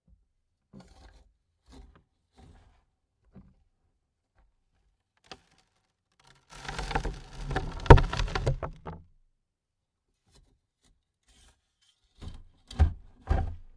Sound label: dirt on coffin lid